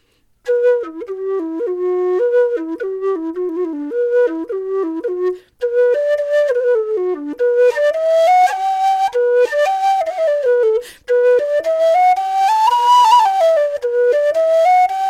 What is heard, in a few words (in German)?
lowwhistle einfach melodie; dixon; Die Low Whistle ist die tiefere Variante der irischen Tin Whistle